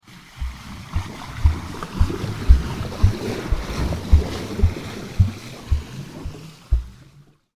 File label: Fast swimming freestyle stroke underwater perspective